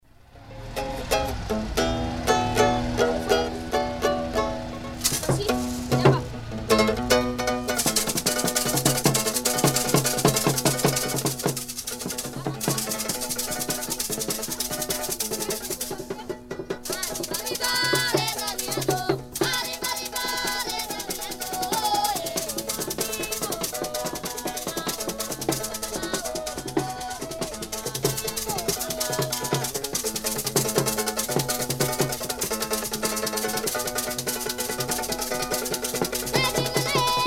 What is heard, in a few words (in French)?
orchestre